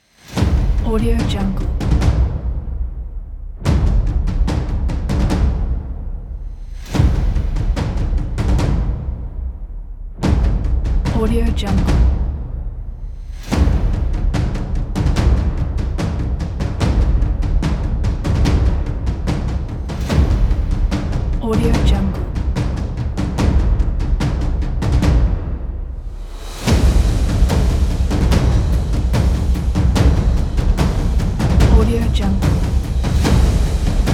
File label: epic drums; hardprosound